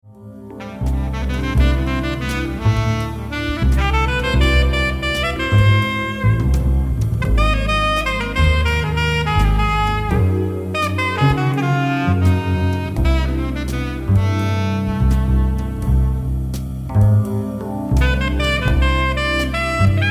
Song Alto; Marmaduke6

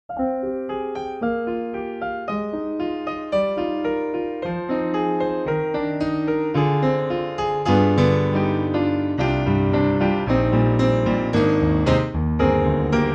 piano; pmi; oldlady

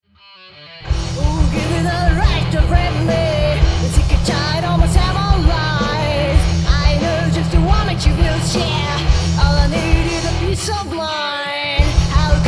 chains

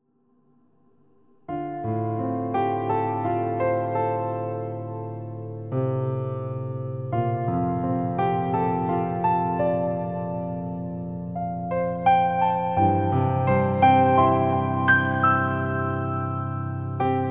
piano

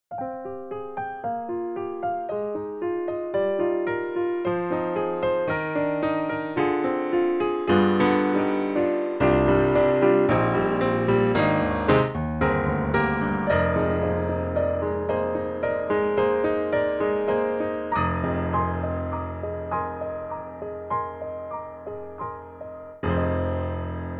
steinway, piano

